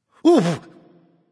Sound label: surprise; smite; voicepack; khepri